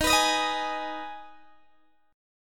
D sharp Suspended 4th Sharp 5th D sharp, Guitar Open D; chord shape